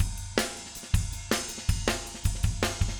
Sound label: 160 Nustyle Drums Chorus; Very Fast Tempo; Pop Rock; Pro Tools Essential Loops; Digidesign